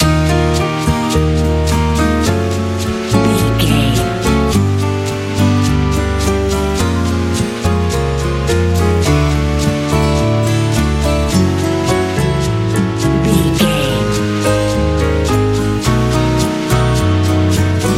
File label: lite pop